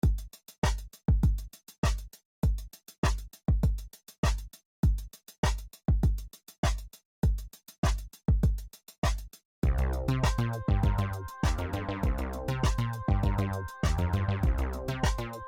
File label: Future Funk